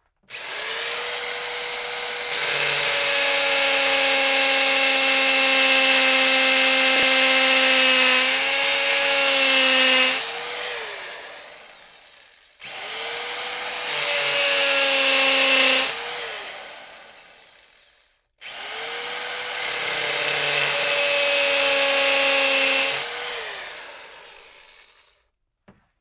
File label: drilling